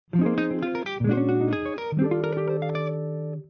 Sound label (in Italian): Arpeggi Irregolari